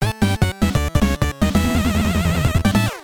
mid scene clear; tmnt

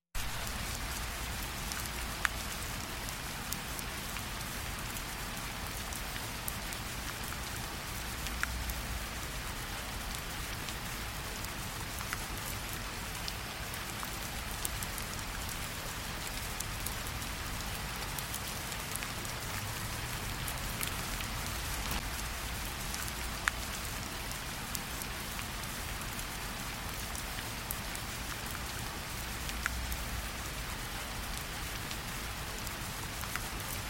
rain ambience

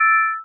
chime; nature-ambient

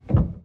piano

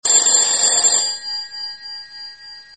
CALL IN

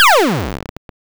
player attack

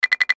error

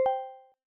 toggle on

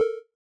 warning

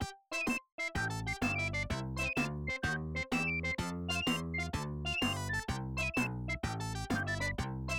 ending theme; talespin

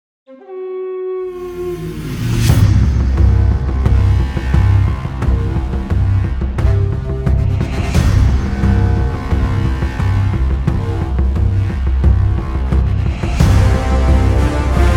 energetic

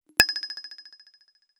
drop

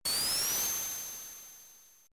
spin dash; sonic

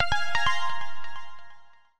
Flip Pages

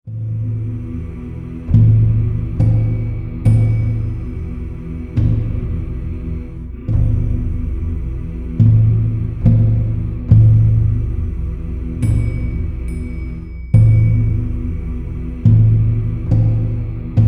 dramatic, bgm

